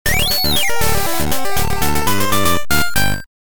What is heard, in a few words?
unlocked; Sonic Battle